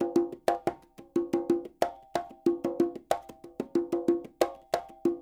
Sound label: SF LOOPS 92, NYC Percussionworks; Sampleheads